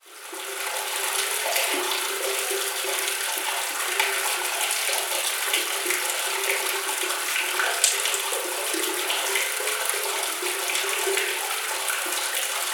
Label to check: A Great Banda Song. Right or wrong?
Wrong